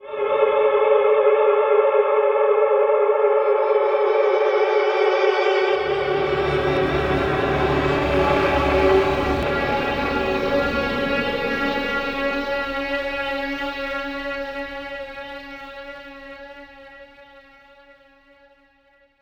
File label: Old Delay Line; Sound FX